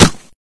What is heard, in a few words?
shell impact, antlion; Source Engine; video game; npc